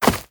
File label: Grass Run